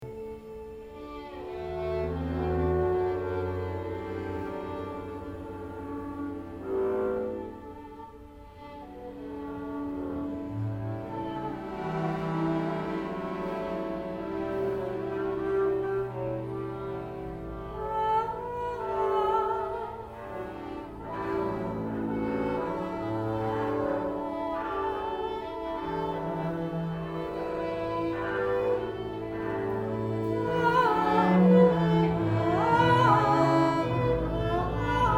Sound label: String Suite